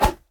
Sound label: skateboard whoosh; smite; mercury